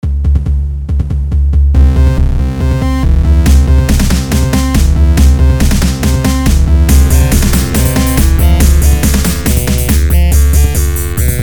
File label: funky beats